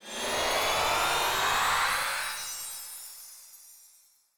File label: respin Out; netent